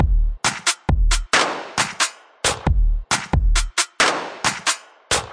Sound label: 135 three four robotic horrors, drum loops and breaks